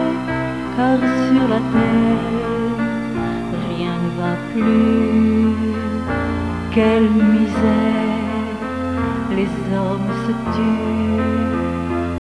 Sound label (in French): pleure; guerre d'Algérie